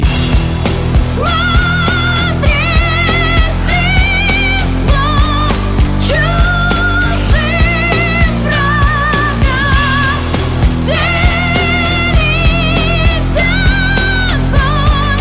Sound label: Slot; patriot